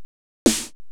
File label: snare